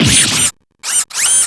damage

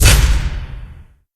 lights; breakdown

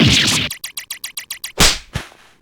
damage; crab

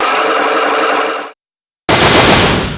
lock start; psyche lock

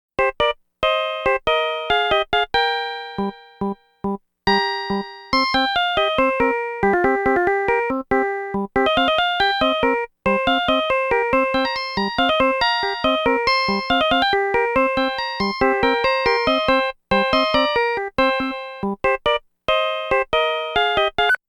ringtone

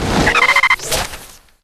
enter

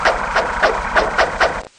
throw; knight

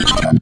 shock

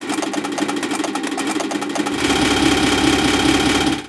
salute

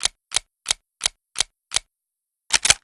reload

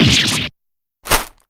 damage

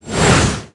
flashback

